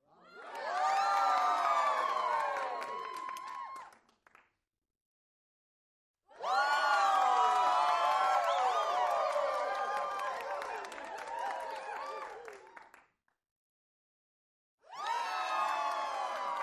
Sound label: Crowd Int Short, small int